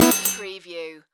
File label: Casino Slot Machine Winning Count End